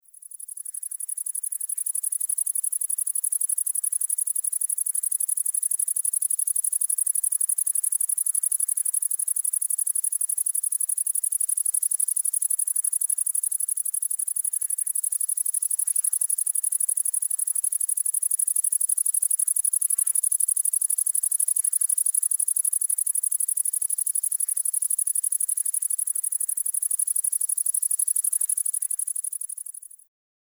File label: Tettigonia Viridissima